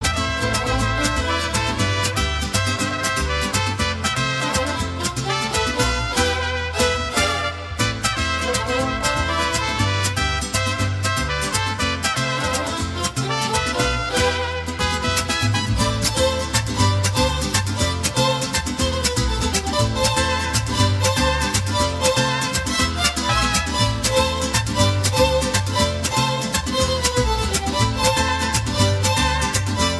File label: Mariachi Mexican Ringtone